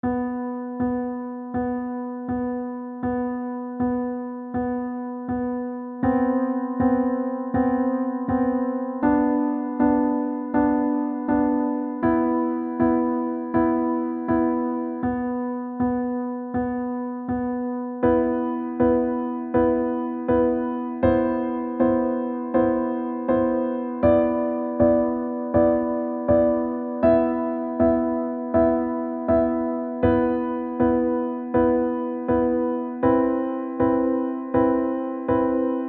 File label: piano; study for piano